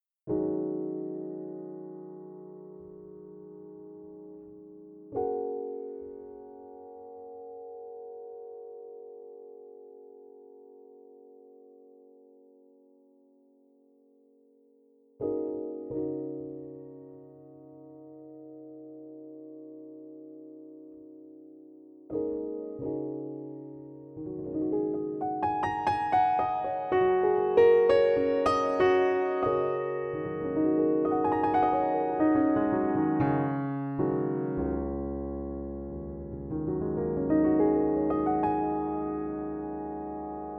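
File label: Close Mics Softness, Pianoteq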